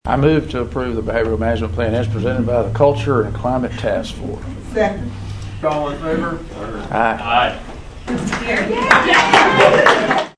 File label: cheering following board approval; BISD; school board hiring 10 educators part new discipline management plan